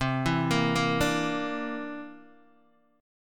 C 9th C down Guitar DADGAD

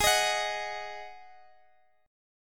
Eb Minor Double Flat 5th Ab

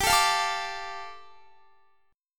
G 7th Suspended 2nd, Guitar; G7sus2 strummed

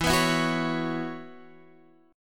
Gb 7th Suspended 4th E, chord

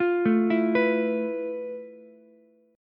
F Major Sharp 11th, Ukulele; arpeggiated; JGuitar

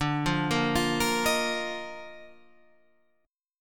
D sharp Major 7th Suspended 2nd D

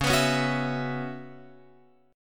Db Minor 11th Db Guitar Open F; strummed

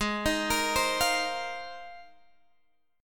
G sharp 6th Add 9th G sharp Guitar Open G

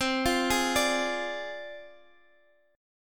G sharp 6th Flat 5th C

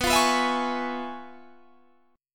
C sharp 7th Sharp 9th, B, guitar chord

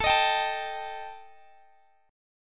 D sharp Major Flat 5th

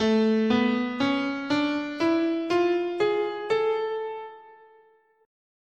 A Harmonic Major, scale

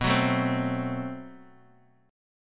A-Minor 6th B, chord; Am6/B strummed; JGuitar

